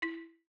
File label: button Click Negative; netent